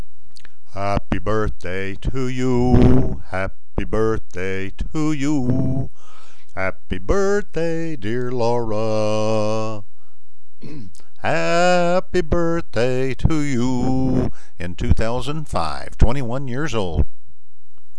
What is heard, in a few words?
birthday singing